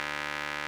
RMI; Sonic Reality - Vintage Timetraveler

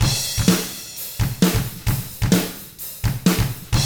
HARD ROCK, Drums

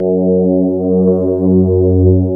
electric pianos; Wizoo Electric Pianos

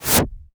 cinematic impact wipe

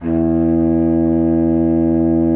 bassoon E2; Xsample VOL-6; C BASSOON

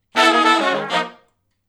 Funk Riff (B) har, Horncraft for R&B; Sections; Crimson Blue and Fabulous Horncraft 4 RnB

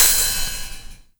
HH OPEN

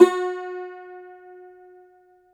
cumbus saz F#, plucked strings; E-MU Producer Series Vol. 7 – Old World Instruments

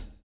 swing

